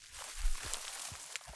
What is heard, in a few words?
Rustly Step, Footsteps, outdoor